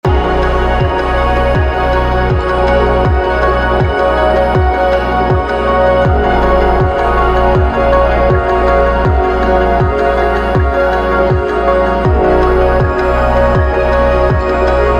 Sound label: June Ambient